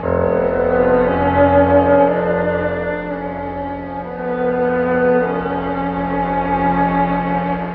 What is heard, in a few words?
strings and piano, Sad; loops